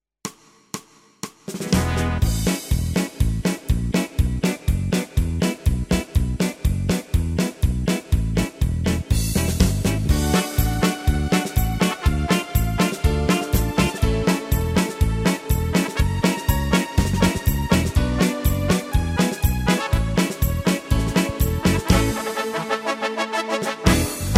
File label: Scandinavian March; tyros2; March Waltz